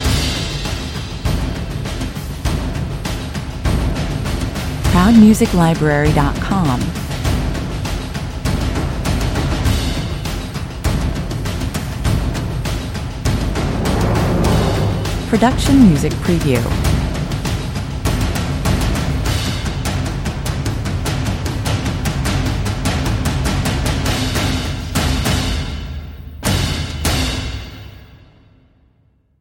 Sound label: percussion mix